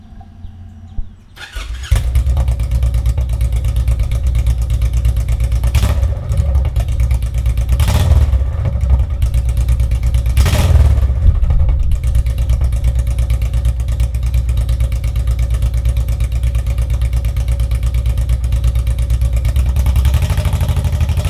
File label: Honda sound, exhaust